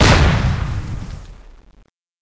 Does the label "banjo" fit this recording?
No